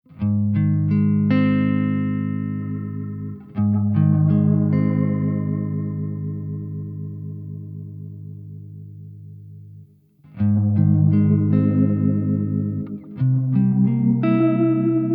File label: Vintage Oil Can Echo, Here, Olivera is used as a vintage modulation machine, with minimum echo values.; Dry and Wet mixed in Pro Tools; Murky Chew Chew